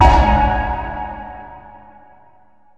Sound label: crystal; weapons; dota; meepo